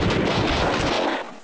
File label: grapes 2 bort; shipcharge; Pirates Of The Caribbean